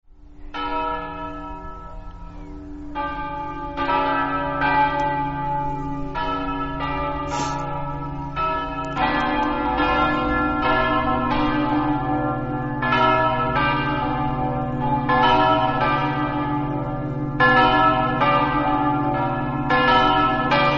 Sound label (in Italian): campane, Aquileia